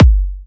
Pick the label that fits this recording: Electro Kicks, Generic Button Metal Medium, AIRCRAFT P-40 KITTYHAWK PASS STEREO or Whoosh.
Electro Kicks